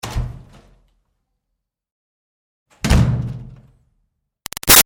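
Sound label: Door Wood Church Basement Room Open Close Hard; Miscellaneous Doors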